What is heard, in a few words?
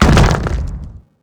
impact flesh crush